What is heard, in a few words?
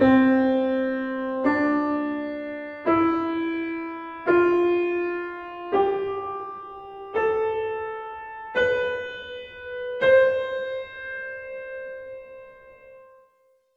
cdefgahc